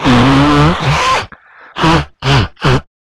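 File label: vocals, Jungle; E-Lab; XTC Files of Jungle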